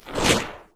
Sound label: spell cast; wand